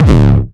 909 Grime Layer 2 - C#1, Drums, Kicks; Mbase 11

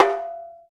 Bongo, Roland BK-5; Percussion

